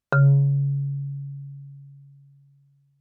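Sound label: Marimba yarn fortissimo Db4; MIS Pitches - 2014; Percussion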